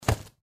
Player Land; Granny Chapter Two

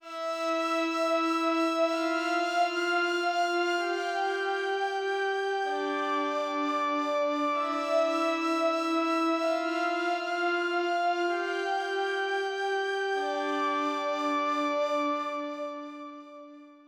Pads; super crush k.o.

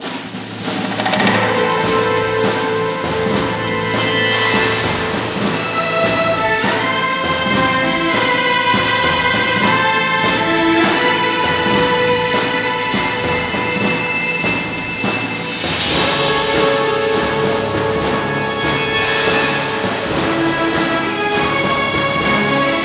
Action

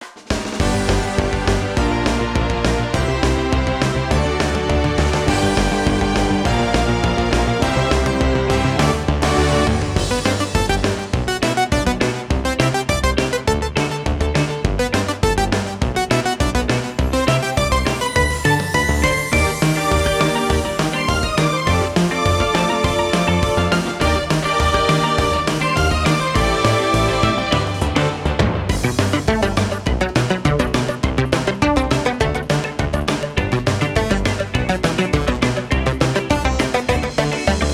Special Reward Music; Kirby's Return to Dream Land Deluxe

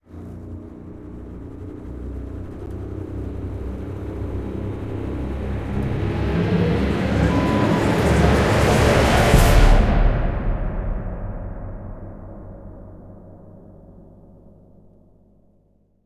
Fear Sting; Jurassic Park The Game

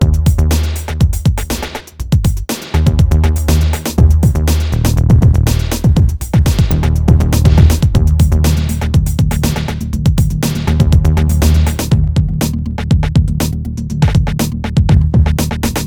Boss Hook Loop; Distracted

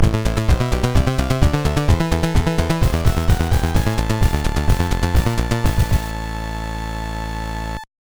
All Clear; Castlevania Anniversary Collection

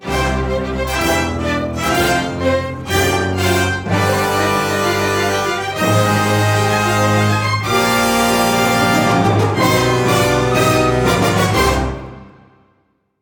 Major Win Fanfare

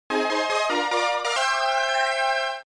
Escape Success!; Emeraldia

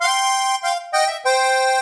Organ Riff; the princess and the frog; Swamp; Music Ray

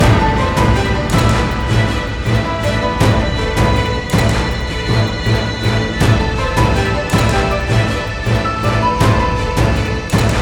Loop; Lost Epic

